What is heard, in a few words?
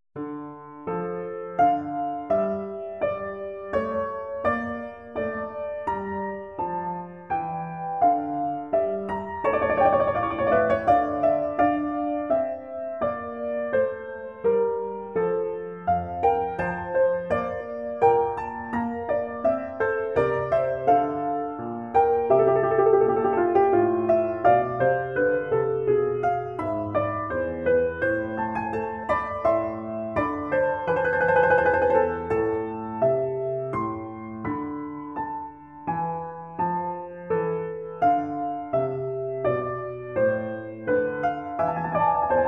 Trio Sonata